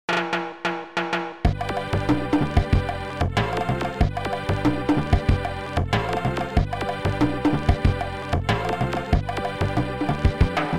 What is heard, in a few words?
Ragga